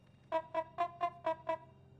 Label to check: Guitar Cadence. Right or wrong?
Right